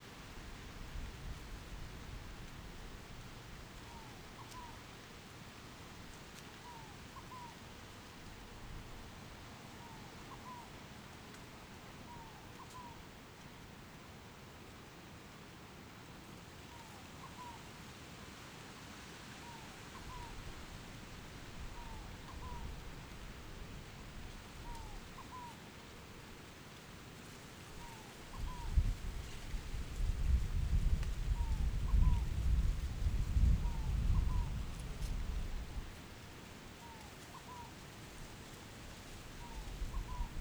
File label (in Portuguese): Ambiencia mata ciliar ventando nas arvores, passaro, cigarra vem e some; longe; ambientes naturais; Coleção Sonora do Cerrado